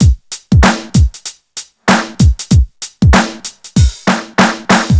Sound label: 96 bpm drum loop c sharp key; sample